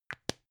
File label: plastic spray can small close short